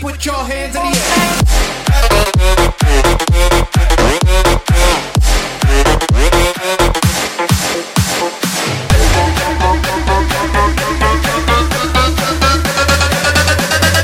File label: Electro